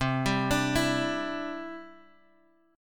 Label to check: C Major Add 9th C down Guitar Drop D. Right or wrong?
Right